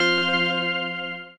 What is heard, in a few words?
autoplay off; Fire Queen